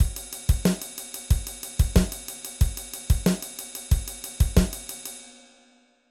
rhythmic example

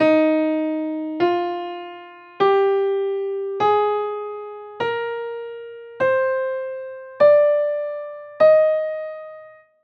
scale Eb major; escalas

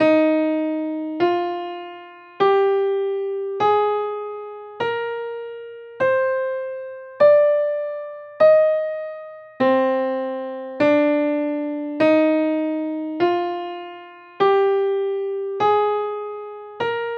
scale Eb major C minor relative; escalas

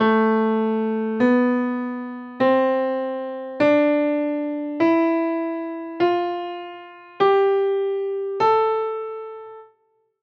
scale a minor; escalas

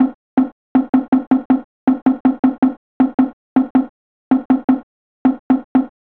rhythmic cells

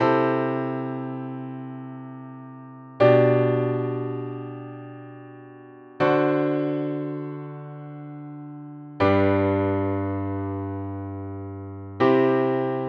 modulation pivot chord; modulacao

